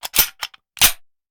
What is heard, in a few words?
Hand Gun Foley; Sound Ideas; Guns